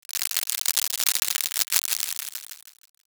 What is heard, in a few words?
applause